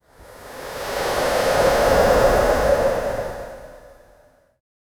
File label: arctic